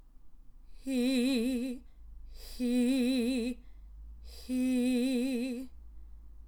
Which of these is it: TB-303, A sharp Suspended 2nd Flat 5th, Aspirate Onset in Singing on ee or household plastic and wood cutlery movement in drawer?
Aspirate Onset in Singing on ee